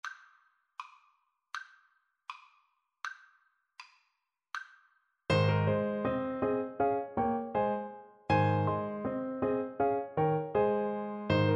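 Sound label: bach gavotte 2, alto saxophone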